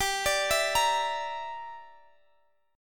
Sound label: A sharp 6th Flat 5th, guitar chord